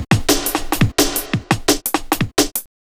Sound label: 172, breakcore